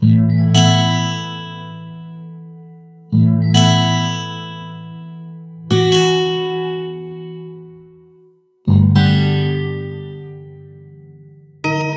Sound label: ring